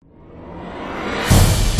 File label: High Music Start; Alien Breed Trilogy; PS3 Windows Xbox 360 gamerip 2011